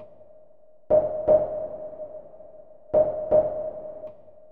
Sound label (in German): echolot; Music Store Sound Service; Einstein